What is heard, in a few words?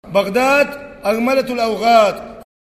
loop